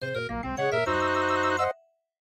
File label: MIDI Animation stings